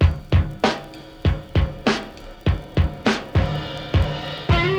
100 bpm breakbeat sample e key

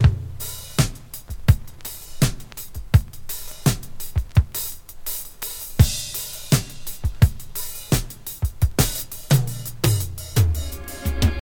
84 bpm drum beat A sharp key; drum loops

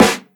clean steel snare drum sample a key; snare-drum-samples

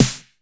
crunchy snare c key; Duration 0:01

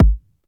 old school phat bass drum one shot g sharp key

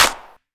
wet clap sample a key; percussion; single hits one shots

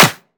hand clap d sharp key; percussion; single hits one shots

